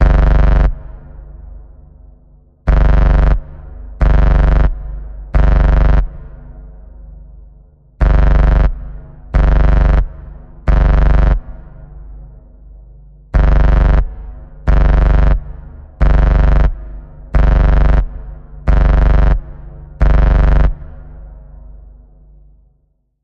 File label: Emergency Death Code Low G, Key of Low G